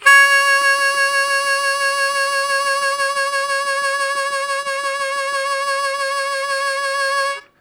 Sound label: Strong Vib Sustain C#2, Harmonica; Zero G